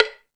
Tool Kit, Drum Machines

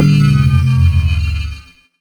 SCI-FI FX

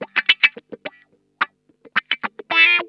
SCRAPE, Funk Guitar; Zero G - Funk Guitar

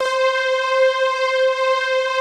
M MUTE PADS; Pro-Rec - Synthsonic

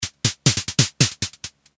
Loop Hat, Drums; leloops

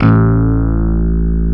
DIGI BASS; DIGI BS

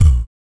VOC PERC, Vocal Planet Jazz FX BASS PERC

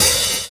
Chamber HiHat; Sonic Foundry (Sony Creative Software); Drums & Drones; Construction Kits

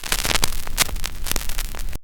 CRACKLE FX; USB Soundscan vol.27

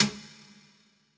Cajun, Percussion One Shots; Essential Percussion

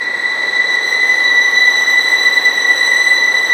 Vls6 mf; String Master Series; Vlns 6 p-mf

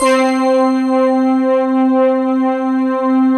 CRYSTAL BRS

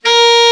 Alto Sax; Sound & Vision - Gigapack II CD 1

